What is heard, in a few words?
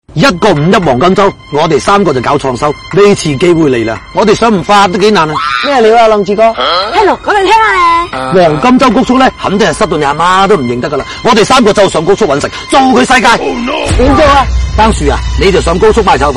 laugh; breadradio